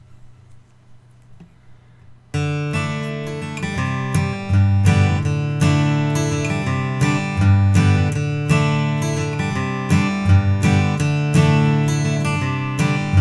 martin custom hj 38 natural

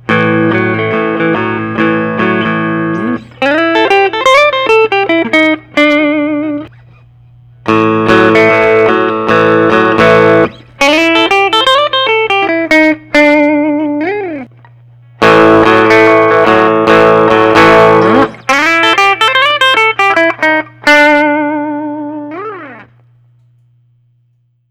Gibson custom 59 Les Paul reissue

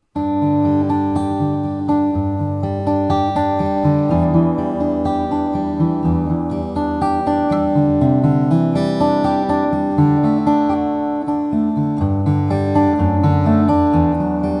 buendia omc french polish

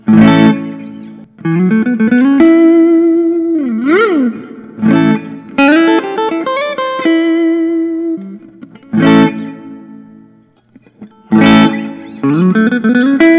Thorn deluxe korina Brazil; black

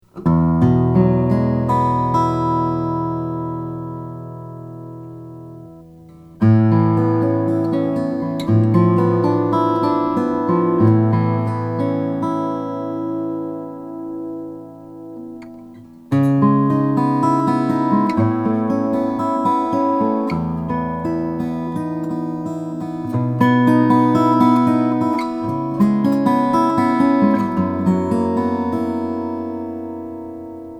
ensor concert claro walnut

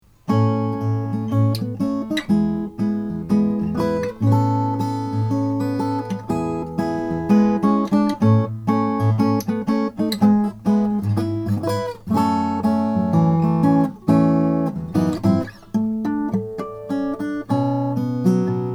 applegate sj cloud cocobolo

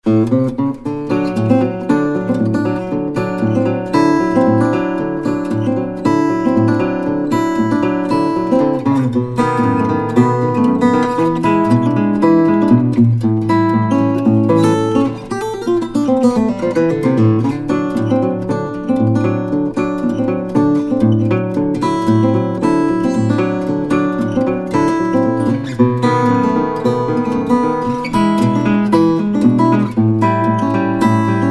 waterloo wl 14

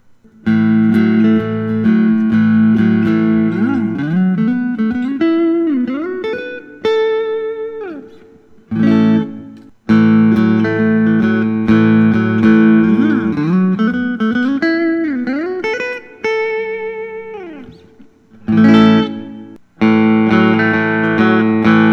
fender custom shop artisan koa thinline

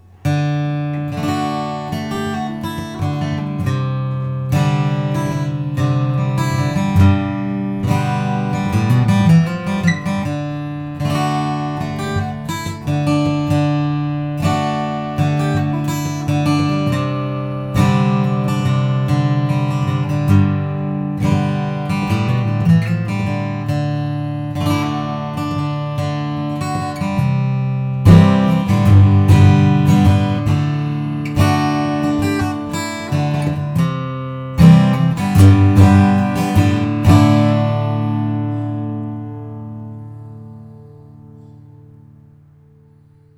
composite acoustics gx; wine red

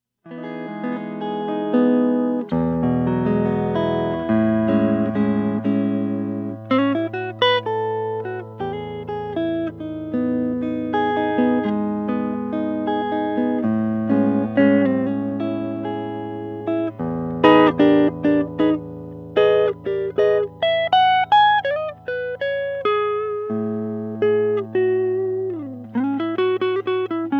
tom bills natura dlx sunburst; archtop

